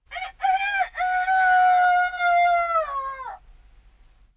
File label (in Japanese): ニワトリ; 耳物語